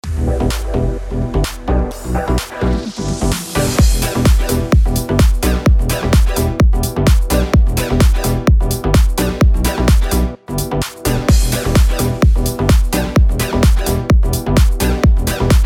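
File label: house, E minor, 128 bpm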